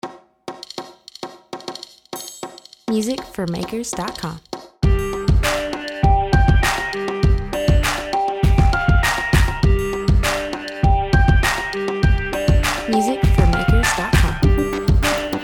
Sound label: high impact overdub